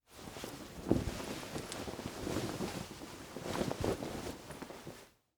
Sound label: Winter Coat Put On, Clothes; Foley; Dressing; Human